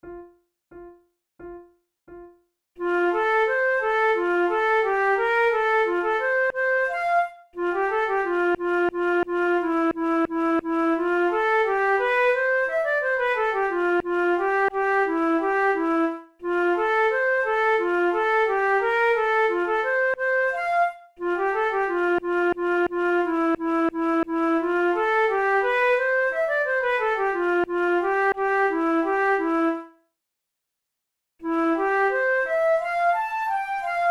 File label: duos moderato, flute; devienne; nouvelle methode pour la flute 18